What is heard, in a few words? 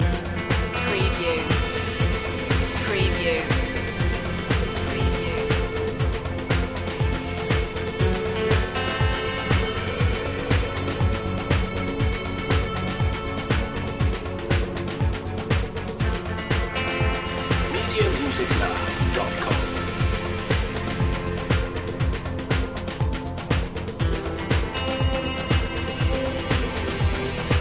spy tension loop